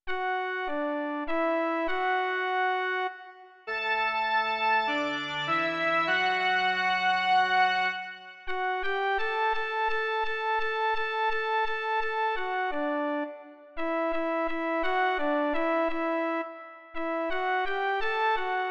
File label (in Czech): jen melodie; Doprovody Farského liturgie